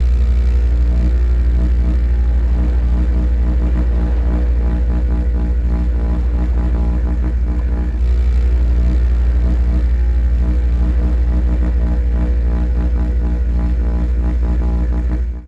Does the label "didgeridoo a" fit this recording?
Yes